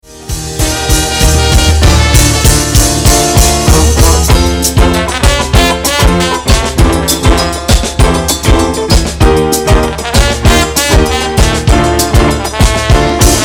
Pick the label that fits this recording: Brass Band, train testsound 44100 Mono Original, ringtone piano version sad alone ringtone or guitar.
Brass Band